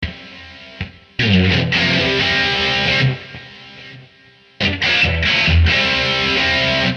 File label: C 138 rhythm